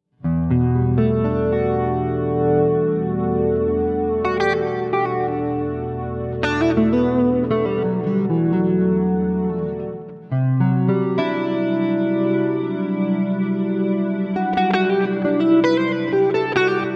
freeze

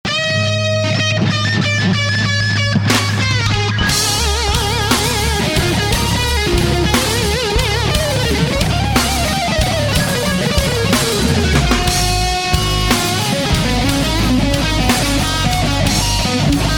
gs10 blue

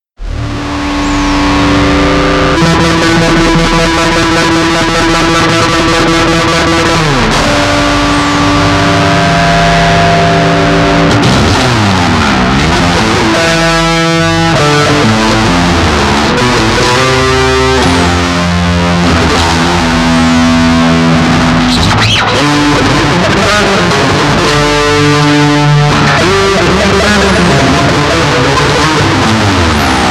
me-20b patch; ME-20B Bank 9, No. 3; Wild distortion sound, good for playing solos.

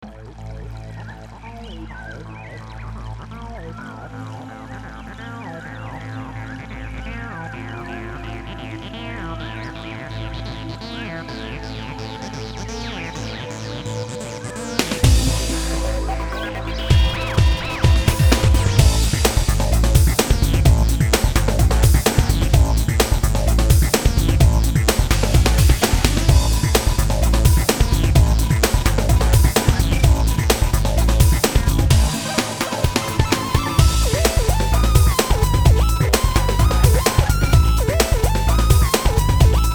breakbeat; fantom x

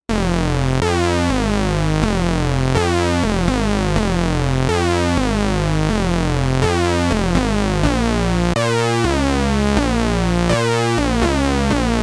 Sync Lead

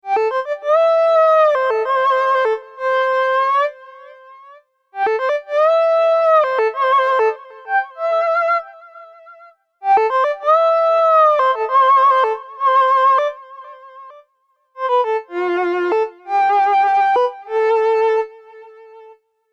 bass synth elec cello

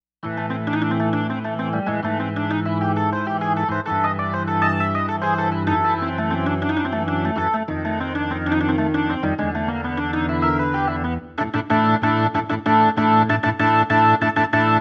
hard rock org; Hard Rock Org 1; JUNO-Di Patch Demo

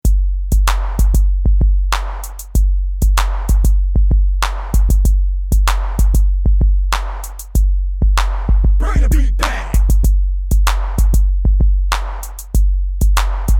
beat

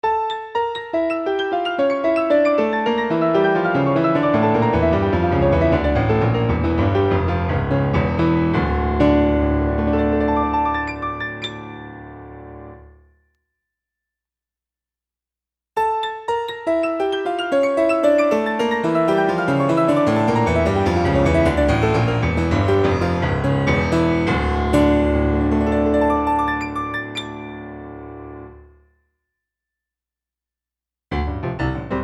piano cross resonance

